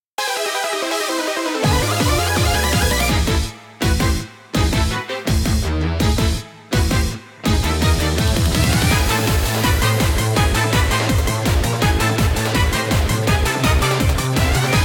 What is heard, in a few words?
Battle Break; Pokemon Masters EX